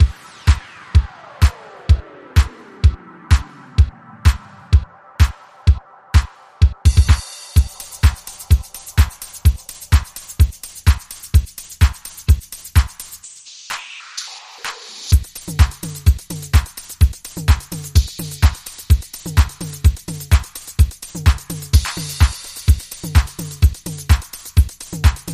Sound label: Percussive